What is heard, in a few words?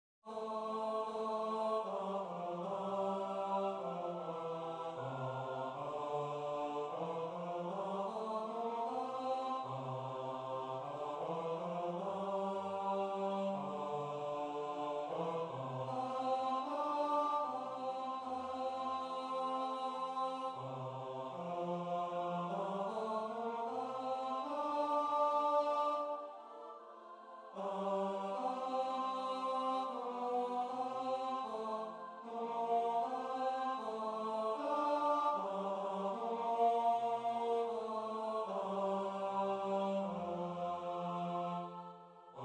baritone; The Silver Swan